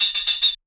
perc tambo; one shots; SEISMIC Drum Sample Pack; Slate

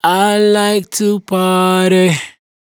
hook Party 100 Abm, Dry Vocals; Raw Vocals; Slate Digital Omnivox Vocal Sample Pack

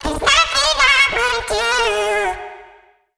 phrase Abm, Vocal; Slate Digital Omnivox Vocal Sample Pack

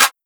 Clap Vengeful, 808; 808 Claps; Slate; Vintage Drum Machines Volume 2 808 Edition

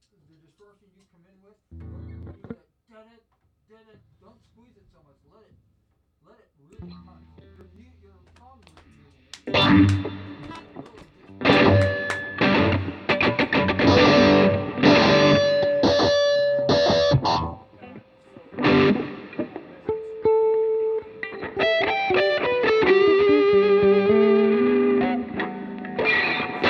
Guitars Mix

